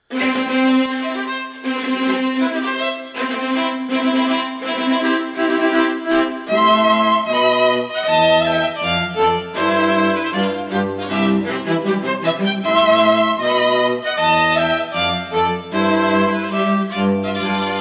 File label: wedding march